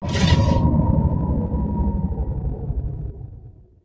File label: sys gen power decrease